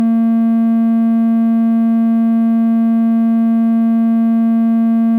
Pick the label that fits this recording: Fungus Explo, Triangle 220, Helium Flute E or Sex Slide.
Triangle 220